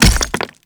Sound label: Stone Pillar Debris Hit; Ambience; Warframe; Duviri; Murmur